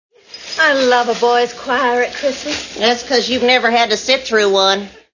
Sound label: boys choir